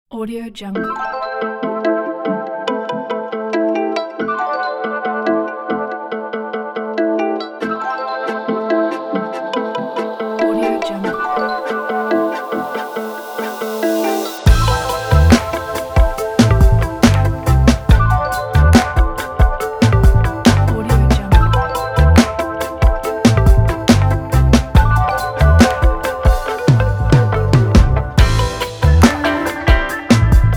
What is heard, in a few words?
modern hip hop background